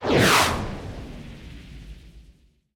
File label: move; Original TK Sounds